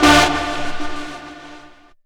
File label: chord A; Techno Trance Essentials; CHORDS